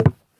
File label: wobbly wood step; Golden Crayon Sounds